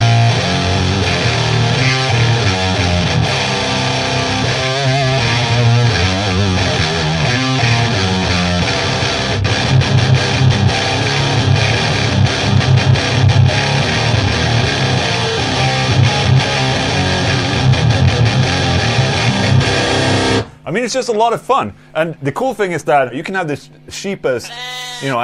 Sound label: guitarman swedish